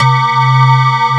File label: METAL BAZZ